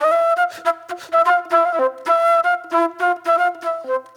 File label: Flute, Disco